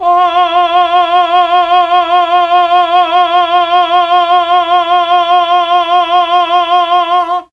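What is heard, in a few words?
F4 chamber male, mellotron; CHMB MALE VC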